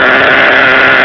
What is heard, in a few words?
savage engine